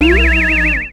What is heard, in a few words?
Boss Hit